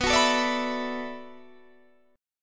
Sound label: B Minor 11th B down Guitar DADGAD; strummed